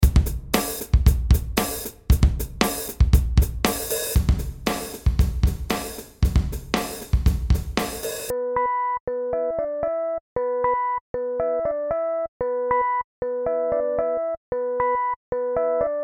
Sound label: Ambience